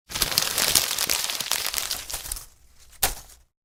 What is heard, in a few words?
Crumpling Paper; افکت صوتی